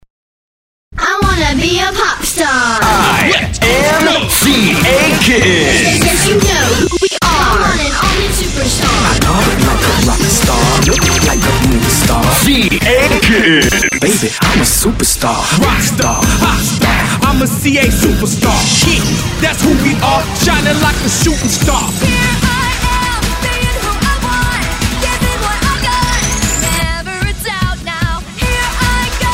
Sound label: Cheer; Alliance Kittens